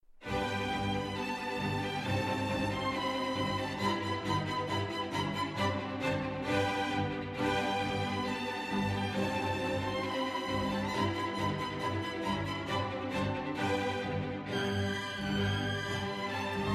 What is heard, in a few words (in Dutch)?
strijkers; negende